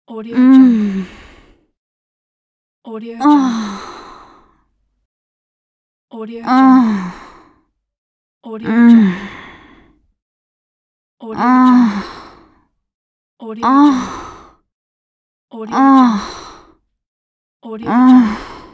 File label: orgasm moan